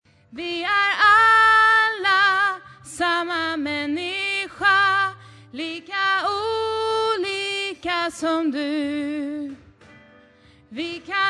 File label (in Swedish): sopran